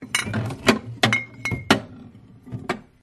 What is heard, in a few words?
pub fridge stock cans bottles; field reports